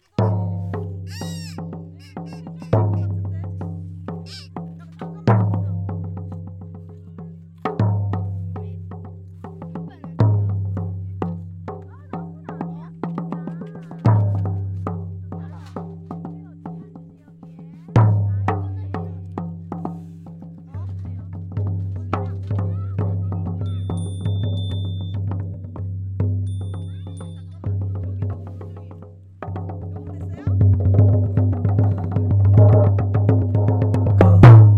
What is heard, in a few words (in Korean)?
북소리; beach